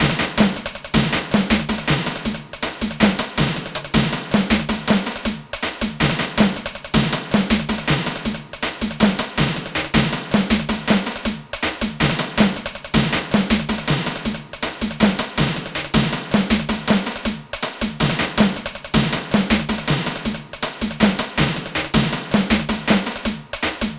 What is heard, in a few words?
Snarez Swing 160, Percussion Combos, Abstract World Fusion; M-Audio; Pro Sessions VOL-38; Abstract World Fusion 2